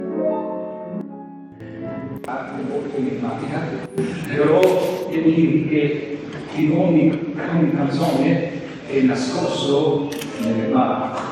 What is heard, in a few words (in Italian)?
Canto Lirico; RADIOGRAMMA